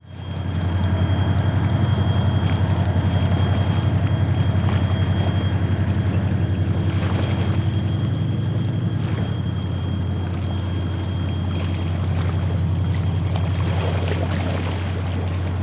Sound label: nature river missisippi waves lap on sandy bank as barge passes diesel engin; engines